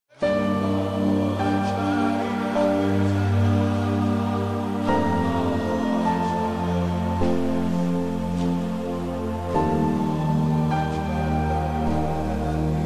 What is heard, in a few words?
Allaho Akbar